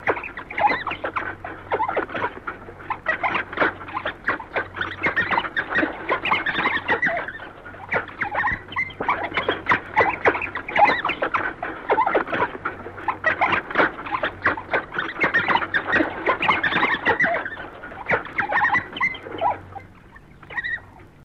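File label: howler monkey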